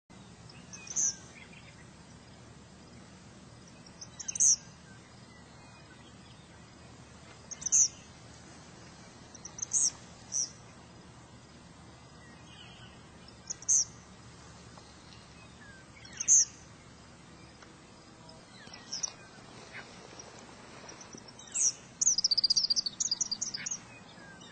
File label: Eastern Kingbird; Birds